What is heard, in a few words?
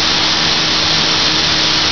turbine loop; fgdata